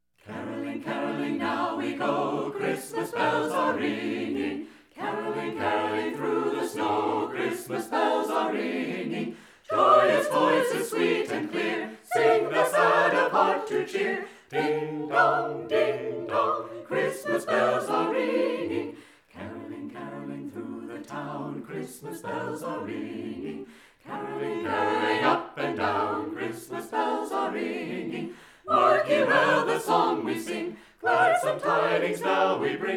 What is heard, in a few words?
Caroling